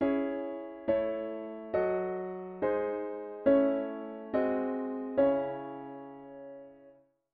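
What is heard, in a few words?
diatonic inverted V7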